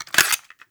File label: Remove Cassette